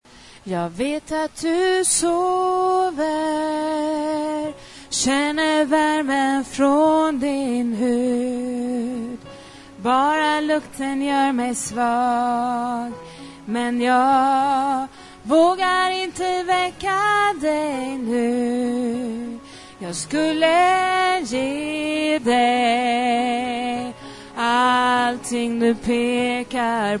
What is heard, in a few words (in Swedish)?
sopran, stammor